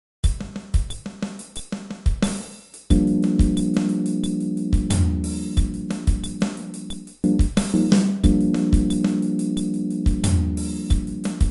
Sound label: Groove ベース無し